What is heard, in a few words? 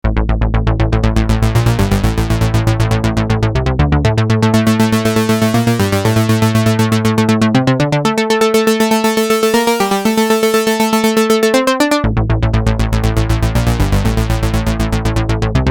synth